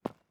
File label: Footstep Concrete Walking; Character Sounds